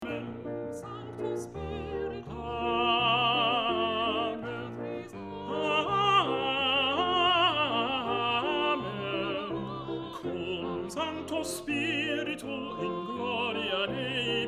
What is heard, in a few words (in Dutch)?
Tenor, Cum Sanctu Spiritu; Rossini; oefen CD; Versterkt